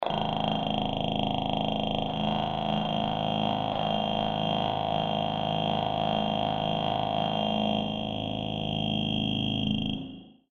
Scanner SciFi Technology, Scanner Data Transmission Hum Distortion; Sound Design